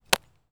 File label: glove, baseball